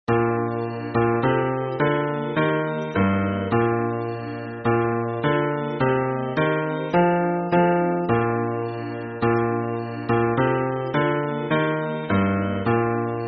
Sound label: hymn bass